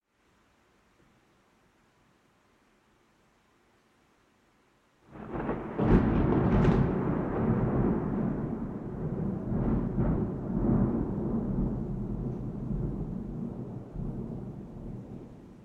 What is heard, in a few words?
Rain and Thunder strong